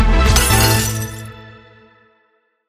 medium win; wild worlds

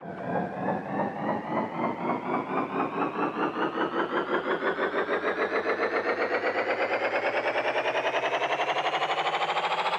near Win; jack and beanstalk

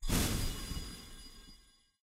near Win End; parthenon

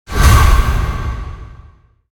icon change; silverback

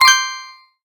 wild count; dazzle

